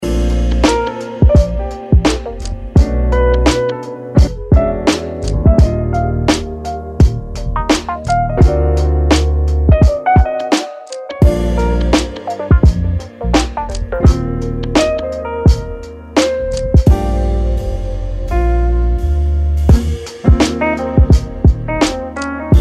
Lofi Jazz

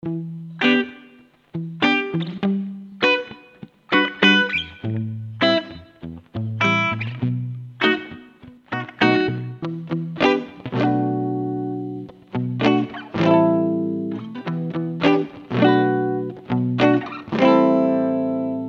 Mutted Guitars

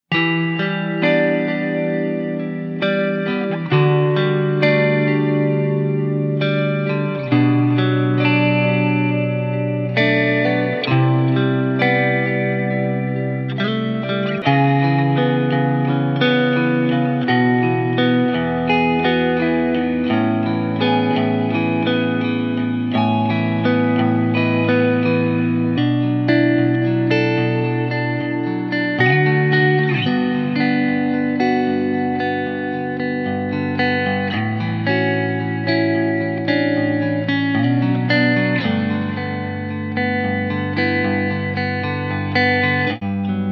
Shoegaze Indie Guitars

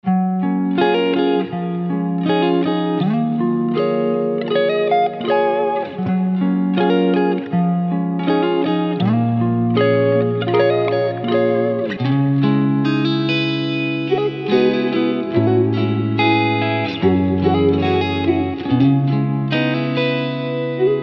RB Style Guitars

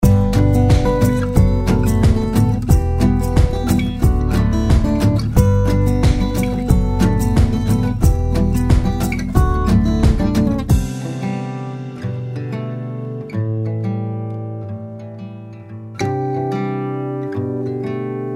Indie Songwriter Guitars

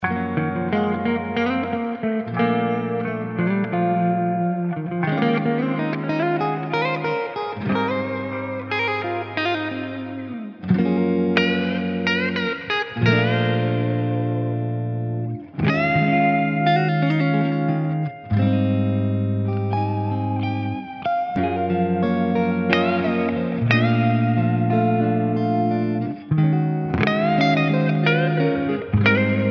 Smooth Guitars

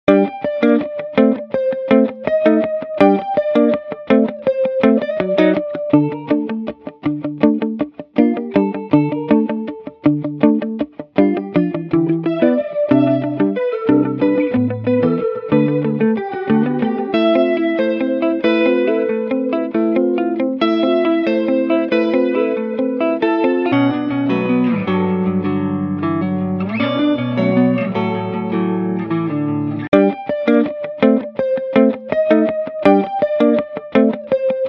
Chill Trap Guitars

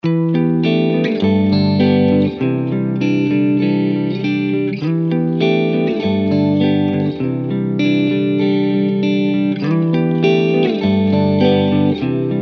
Smooth Guitars

